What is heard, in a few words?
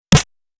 snaredrum